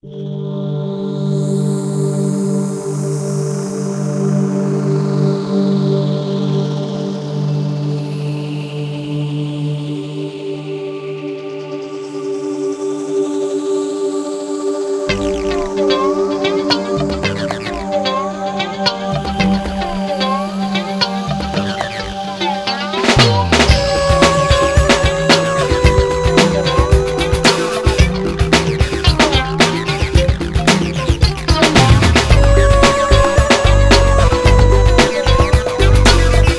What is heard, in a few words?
Music Small Forest